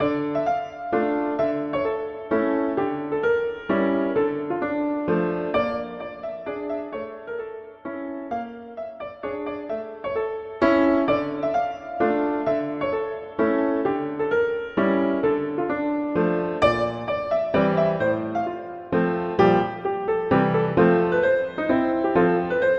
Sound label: Mazurka; Tchaikovsky